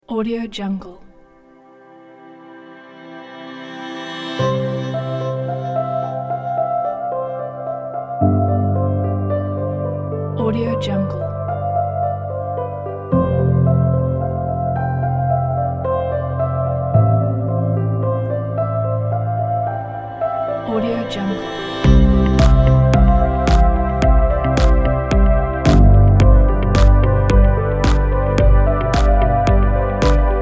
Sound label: soft motivation kit